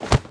hitreact minor with Impact; HighGroundRoyaleNetcode

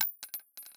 Shotgun Shell; HapticSamples; Weapons; Feel; NiceVibrations

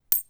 Coins; Feel; NiceVibrations

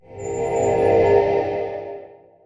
revived; HighGroundRoyaleNetcode

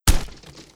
Wood, Impacts; HapticSamples; Feel; NiceVibrations

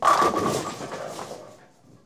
Strike Hit; Feel; FeelDemosURP

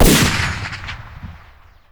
Sniper Fire; HapticSamples; Weapons; Feel; NiceVibrations

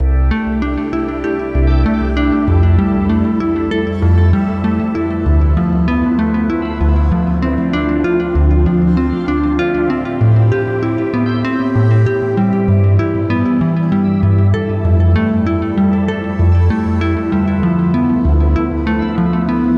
Blob Music; Feel

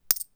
Coins; Feel; NiceVibrations

